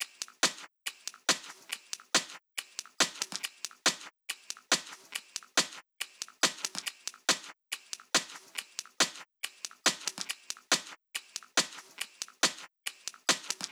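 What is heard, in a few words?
drum Loop 140BPM hi-hat Loop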